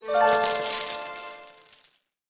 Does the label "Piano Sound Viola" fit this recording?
No